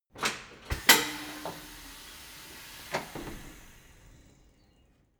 tire changing machine hydraulic arm separator in

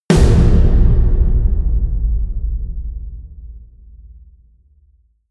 huge snares

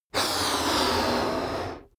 balloon blow long

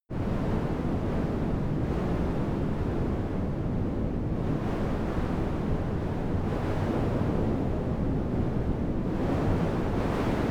wind soft exterior

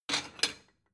plate small take slide hard

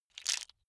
bone small handling right

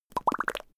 bubbling lips

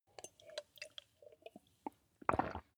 drink swallow hard

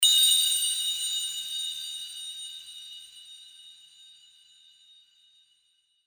esotheric bell high

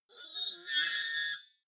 metal lid screech high

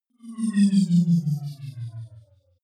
wobble drop hover

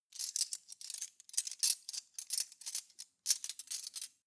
cutlery teaspoons handle long

